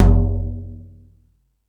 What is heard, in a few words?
More Toms, E-MU Pro-Cussion